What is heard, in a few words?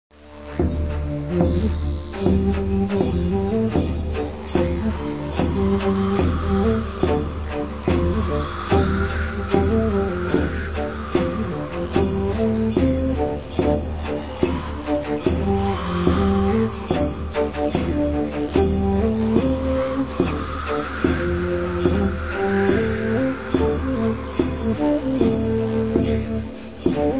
Eagle Wind